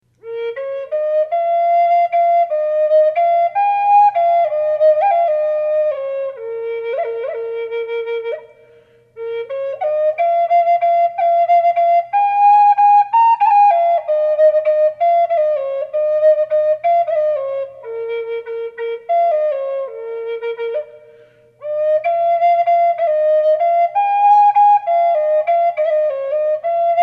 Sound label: alaskan yellow cedar reverb, flute; running wolf; collector flutes